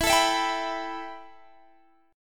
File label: A 7th Suspended 2nd E